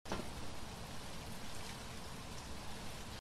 wetter; Phasmophobia; gamesoundopedia

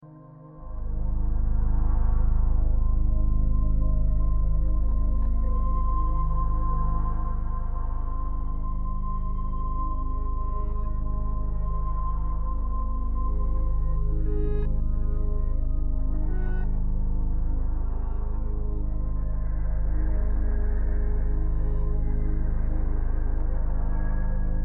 flugzeug austellung musik; life is strange

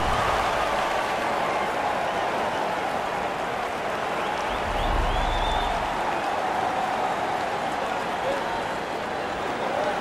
zuschauer neutral; national hockey league 2019

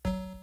ziegel fallenlassen; gamesoundopedia

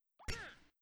schlag; battleblock theater

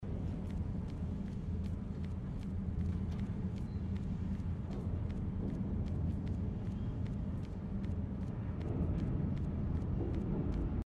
klettern; Little Nightmares; gamesoundopedia

